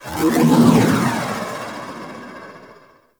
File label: Shield Random; Warframe; Archwing; ArchwingShield